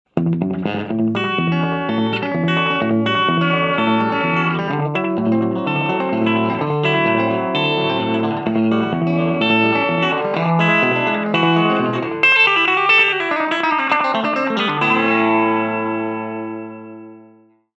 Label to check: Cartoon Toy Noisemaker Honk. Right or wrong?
Wrong